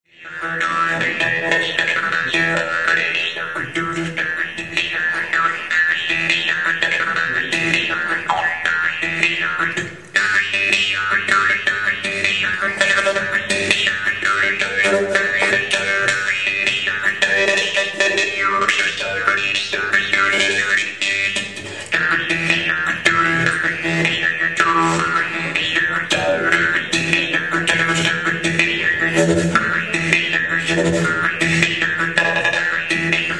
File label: Khomus Set, Jews Harp